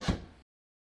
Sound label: Door Close Push Door